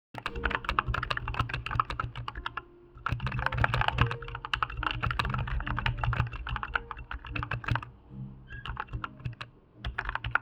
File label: Epomaker AK84S modded typing sound